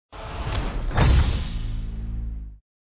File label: WINNING